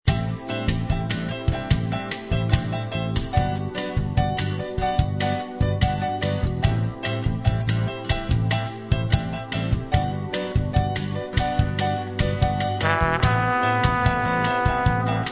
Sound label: magic melody